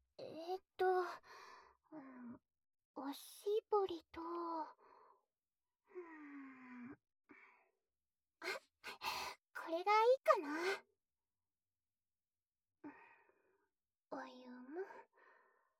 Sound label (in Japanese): 『イヤー・クリーニング』